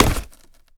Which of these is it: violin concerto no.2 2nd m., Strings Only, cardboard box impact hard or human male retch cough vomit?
cardboard box impact hard